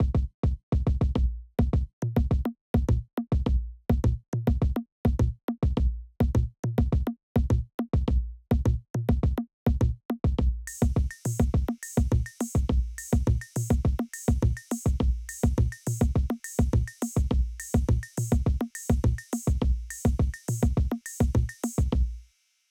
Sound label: Perc; Snoozetown